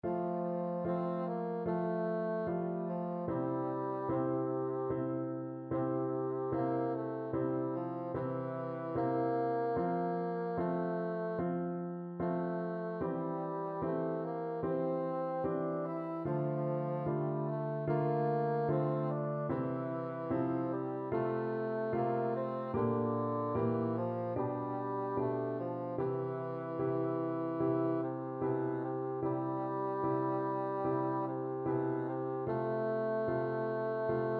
vivaldi winter, bassoon

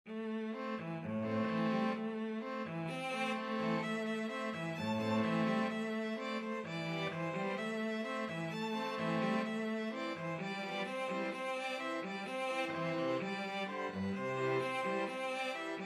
chanukah, string quartet

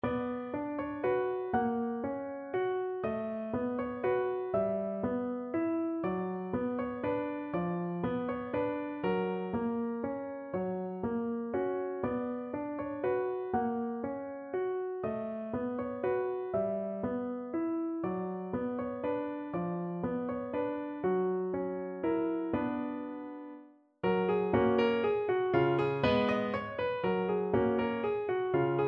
waltz piano; gurlitt; op205 10

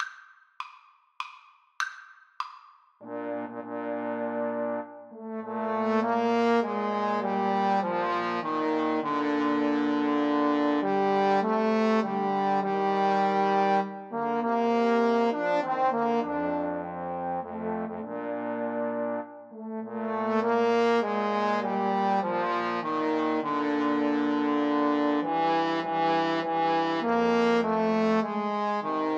Trombone Trio; mendelssohn nocturne

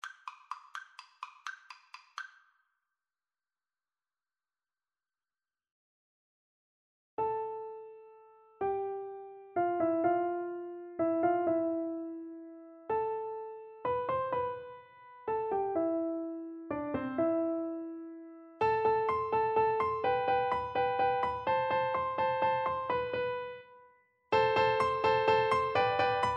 spanish violin piano

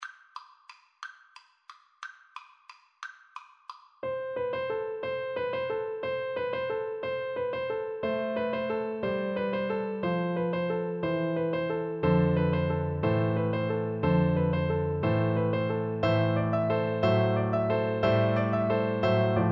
carol of the bells Recorder

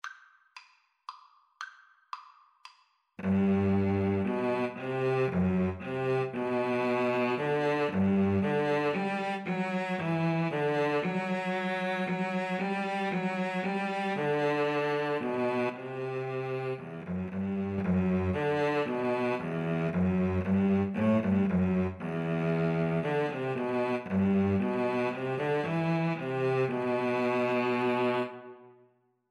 be thou my vision, Violin Cello Duet

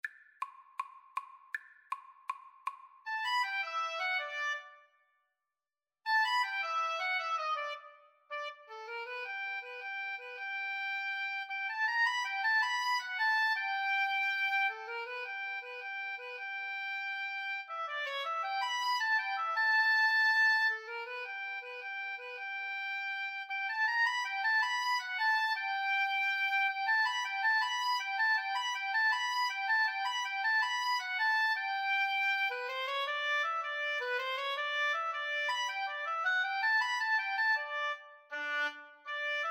the entertainer, Oboe Duet

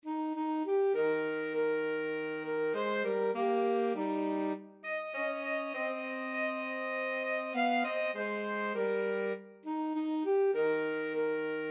SAX

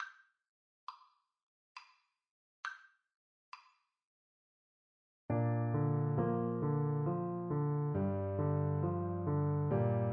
cader idris, Trombone

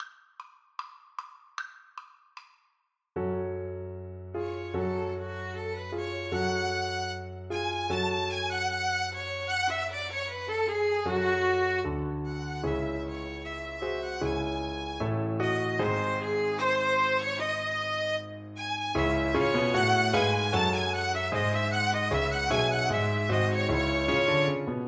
violin duet

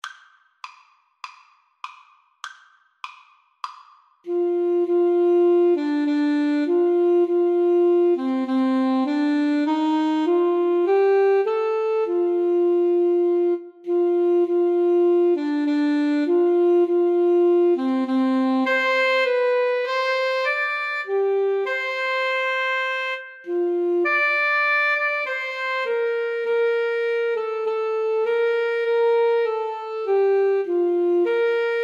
america the beautiful A SAX duet

